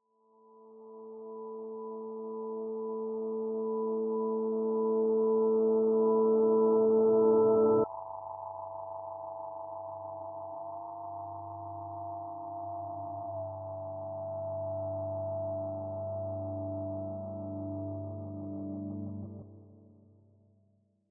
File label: cinematic riser sound abrupt stop and drone